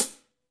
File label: HH, Kit s; Real-Kit s